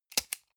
sunglasses plastic grab hard

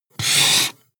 marker mark hard

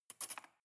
cutlery cake fork drawer place soft

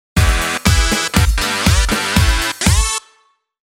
fashion beat

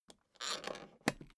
suitcase close latch lock slow

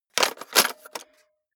cassette into cassette player

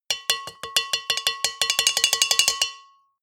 bowl hits rattle fast long

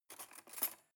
cutlery cake fork drawer place

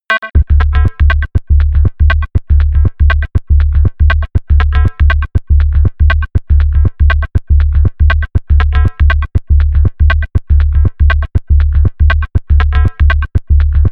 level music

